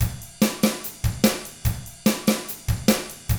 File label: rock drums